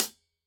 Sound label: HIHAT 14 closed tip tight